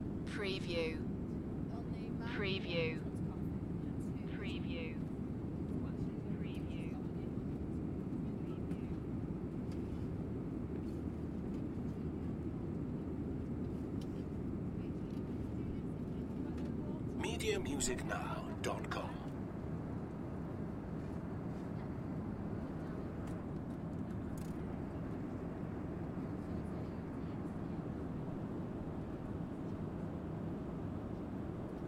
Aeroplane cabin; beanstalk audio

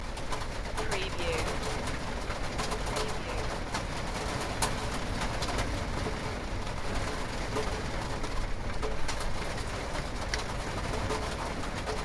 Heavy rain loop; beanstalk audio

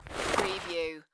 Snow and Ice, Slide on snow; Weather sound effects

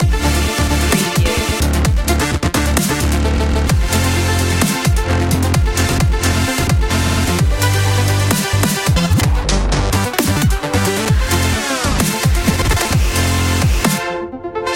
beat the grind loop

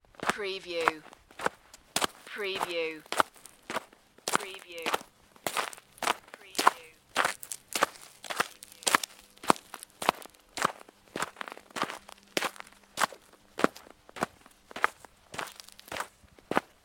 Snow and Ice, Footsteps snow hard

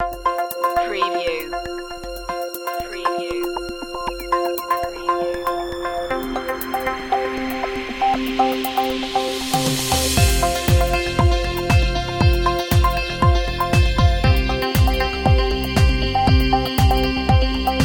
atmosphere

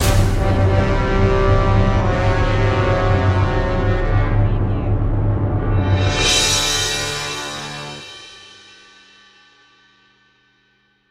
Music Orchestral

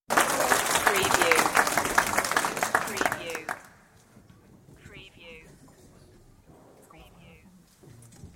Audience Applause; beanstalk audio

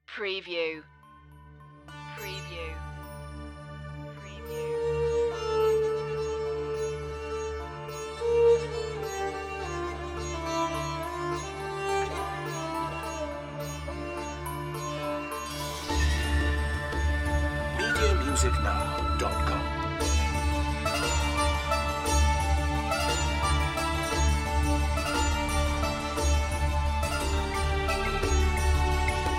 india less percussion

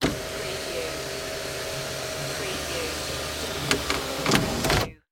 Auto Sunroof Close; sound effects bible

